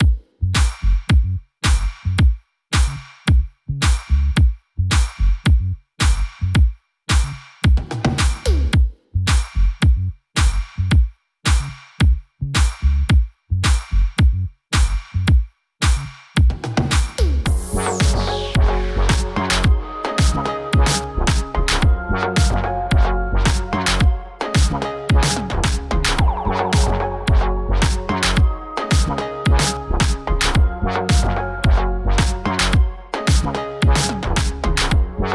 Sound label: Metal Guitar; rock